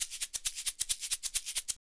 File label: bip 2 bip